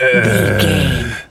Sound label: gorilla growl deep